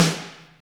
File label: SNR, Tekno Industrial; East West